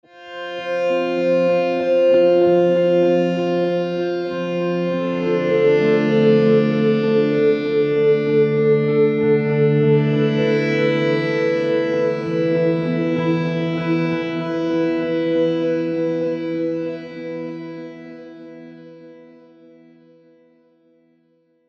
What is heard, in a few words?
Scoring Guitars Pads; Binary Star